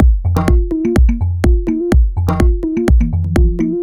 A# 125bpm, House Drumloops; 90 s Sample CDs; Zero-G House Fabrik Drumloops; ZG Drumloops

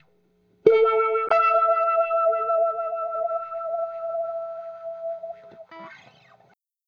FX Guitar

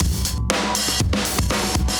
Beat Style, 120bpm, breaks

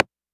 Woodblock; LIMAT Div Dept; Drums

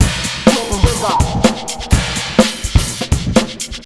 beat, Electro 125, Big Beat Drumloops

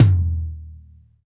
TOM, Drum Dry; East Collexion; Drum 1

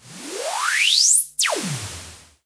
Virus Noise

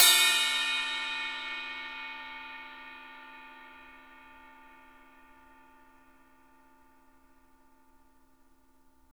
RIDE B H L; Roland MV8800; RIDE CRASH

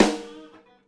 HIT; PlatinumPhattCD1; E-MU Producer Series Vol. 8 – Platinum Phatt; Grooves Filz Hitz

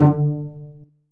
string pizz, Akai S-1000, D2; Samples vol.55 - Retro Sampler

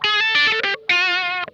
NOSE LICK, Funk Guitar; Zero G - Funk Guitar

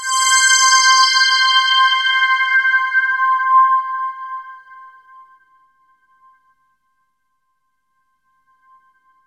JV-1080

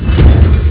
blast; Amiga Soundtracker sample

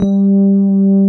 RHODES; Pro-Rec - Synthsonic

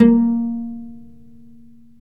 STR VC PIZ, String, Chamber Pizz; Roland - String Master Series

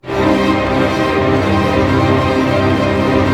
STR ARP MI, Symphony Orchestra, STR Arpegg. Minor; Roland LCDP08 Symphony Orchestra; STR Arpeggios

